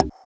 Conga; Equipped Music; Loungin House

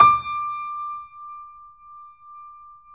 D 5, Keyboards Acoustic, GRANDP ST; USB Soundscan vol.17

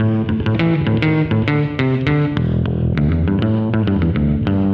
BASS, BASS GTR

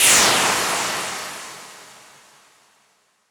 NOISE, Production Effects

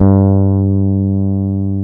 FRETLESS G2, BASS; AKAI S-Series CD-ROM Sound Library VOL-8

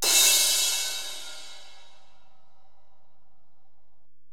Ride, Alesis DM-PRO

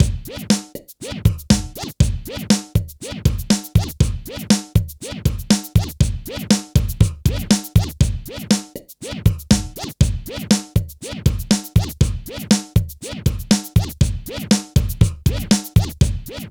ROCK, Funky; INIS

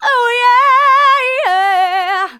Female vocals and robo-vox

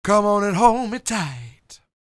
SING, Vocal Planet Blues Soul BLUESY

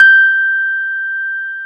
Keyboards Old School, G5; USB Soundscan vol.09; AKAI

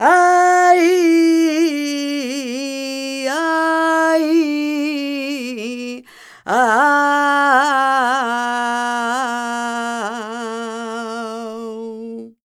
voc fm, Flamenco, Vocals, Female Cantaora; ProSamples vol.46

